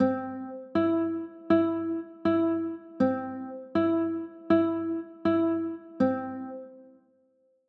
a m, chords

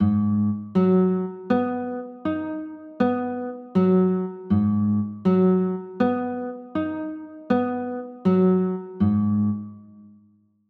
a flat m7, chords

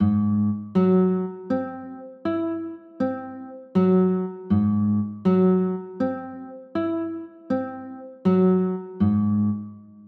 a flat 7 sharp 5, chords

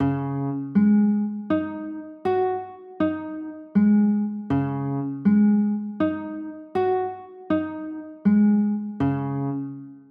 a flat 7sus4, chords

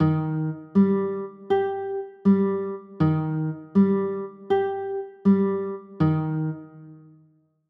e flat maj, chords

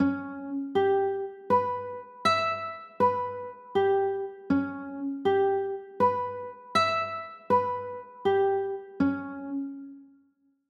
c sharp m7b5

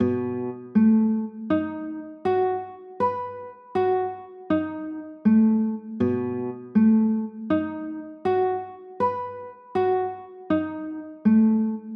b 7 b9, chords

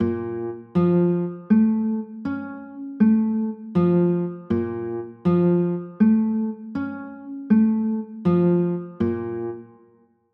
b flat m maj7, chords

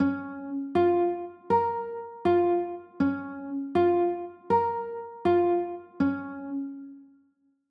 d flat 7; d flat 6

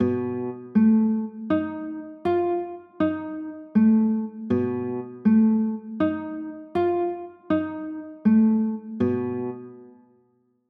b 7 sharp 11, chords

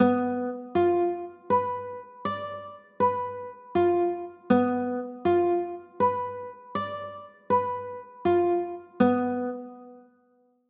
b dim, chords

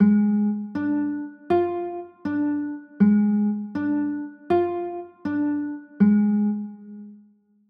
b flat 9, chords

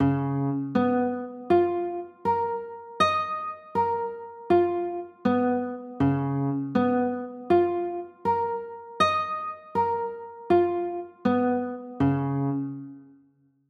c sharp 6 9, chords; c sharp 13

